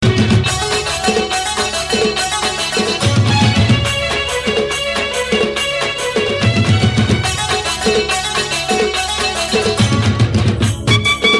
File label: Odiya Tune